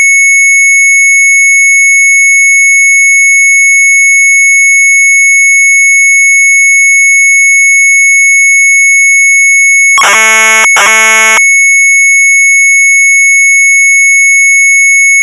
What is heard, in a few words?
MZ800 Tape, sharp; FIGUREN; retromelsarchive